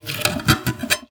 Classical Guitar Extended Technique

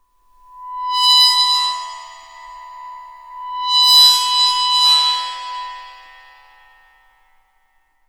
Cymbal; Percussion Samples

